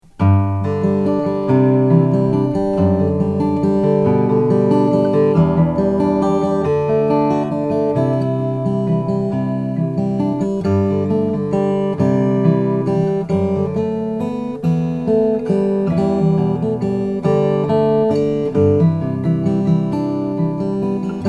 mcpherson mg 4 5 madagascar rosewood

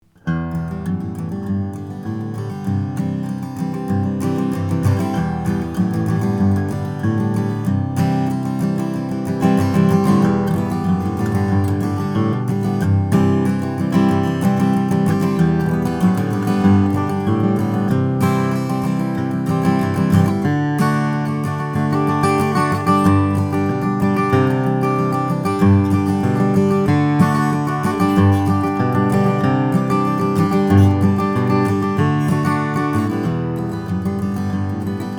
beneteau african bla cs

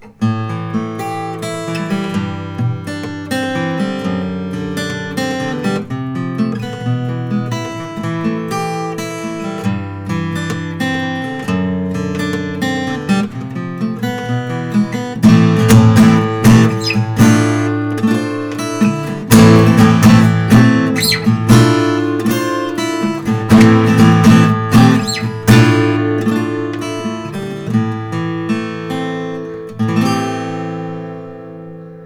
Huss Dalton 0 SP parlor 12 fret; natural

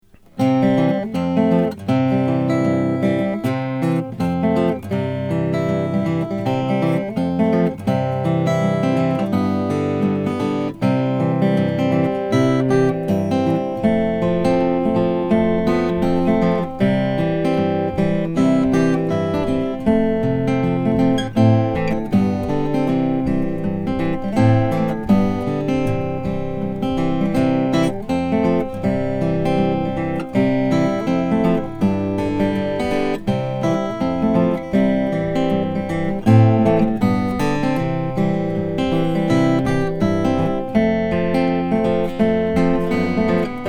greven sd vintage maple

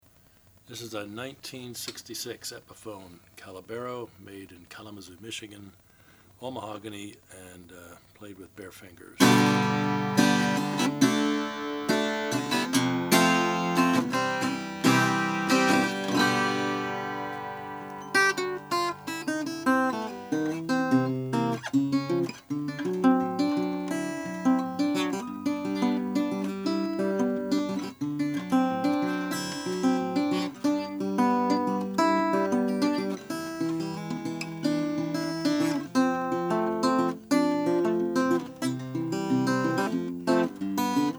epiphone caballero ft30 mahogany

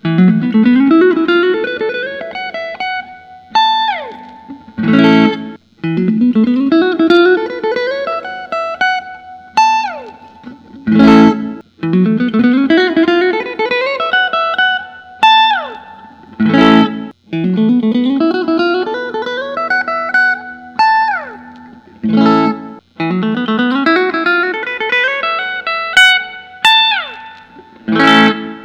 fender custom shop wildwood 10

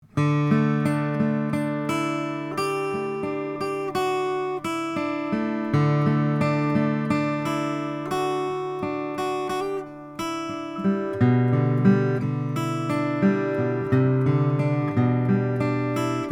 huss and dalton 00 sp indian rosew

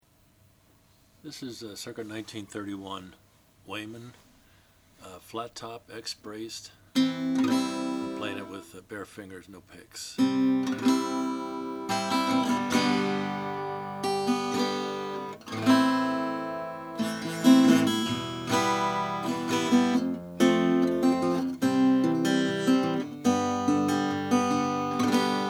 weymann style 740 natural

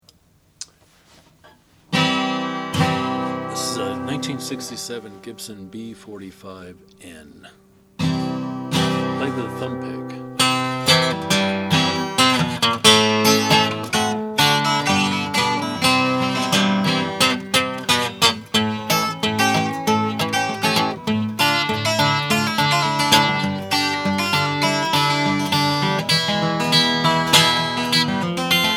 gibson b45 12; 1967; natural

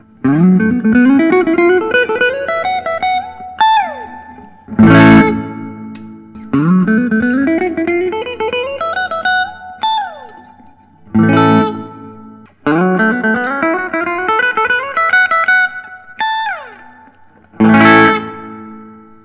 gibson custom 59 les paul

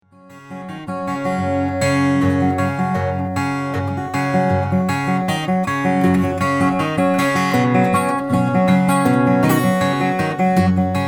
Froggy Bottom 12 string model F

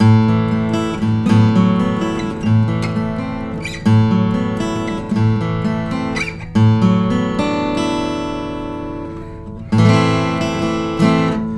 Martin 000 28VS; natural with aging

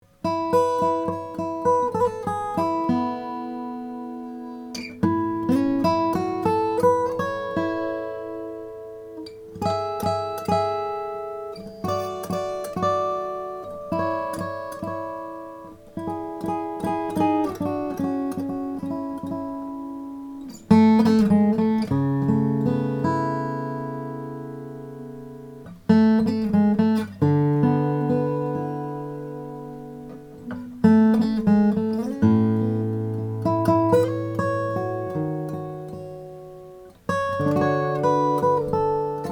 tippin santa maria omt all mahogany

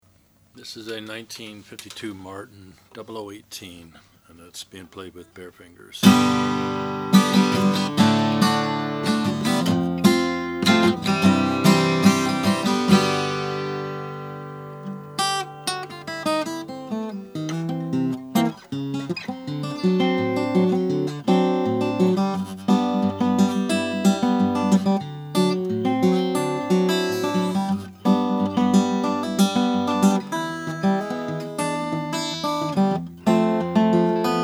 martin 00 18 natural

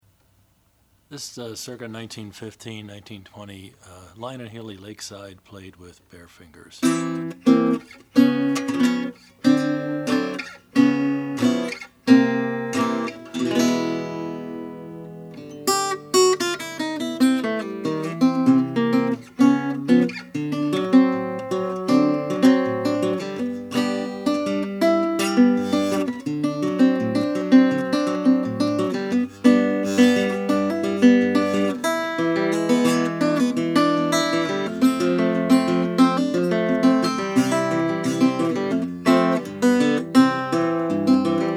lyon healy lakeside; 1915; natural

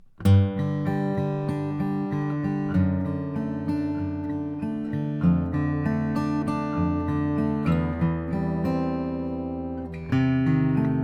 bourgeois om vintage indian rosewood

